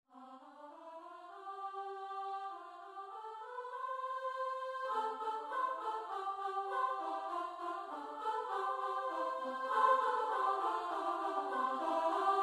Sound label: kanon, midi; nowowiejski; lec piosenko